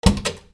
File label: CARS